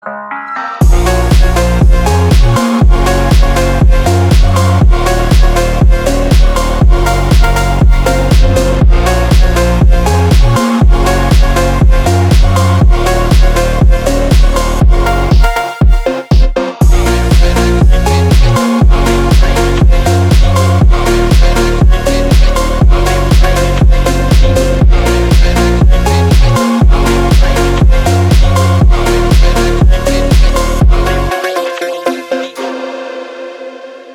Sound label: organ